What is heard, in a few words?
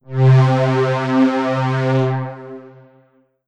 Yamaha FS1r Obie Strings C3; Synthesizer - Strings